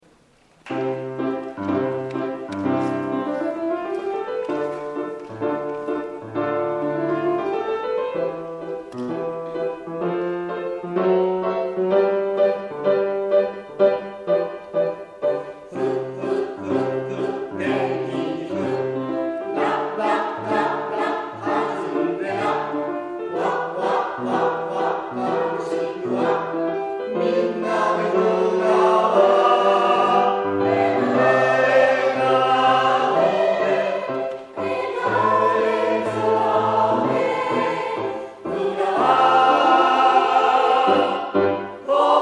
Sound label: chorus